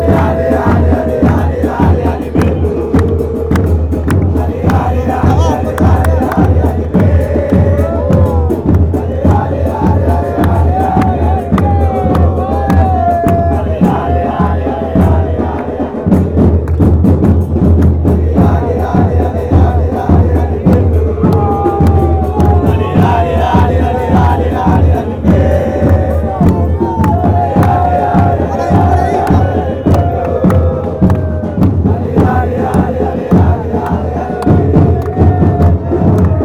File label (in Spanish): deportivo merlo chant